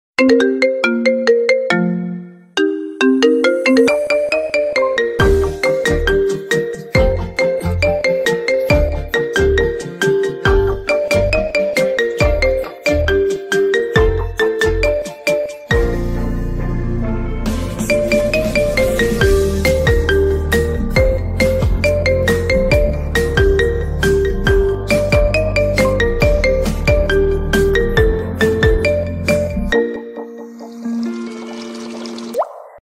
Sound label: Marimba